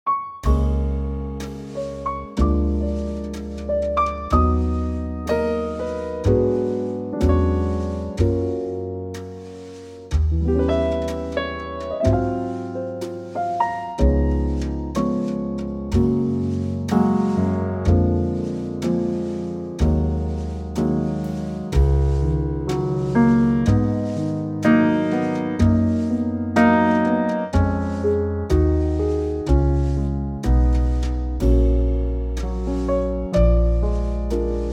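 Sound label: trio G flat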